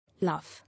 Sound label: Laugh